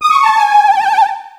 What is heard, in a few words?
Mystery Note